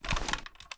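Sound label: raise first movement; gmod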